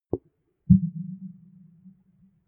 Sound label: bubble underwater